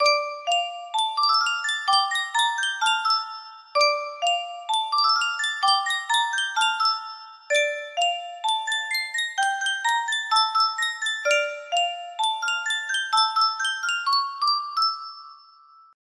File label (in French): Yunsheng custom tune boite a busique